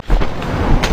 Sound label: critattack; LoL; janna forecast